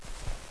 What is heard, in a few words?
snark throw